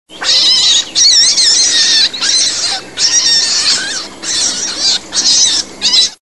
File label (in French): lapin, animaux